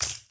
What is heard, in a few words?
broken glass step; Golden Crayon Sounds